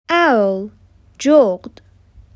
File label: Owl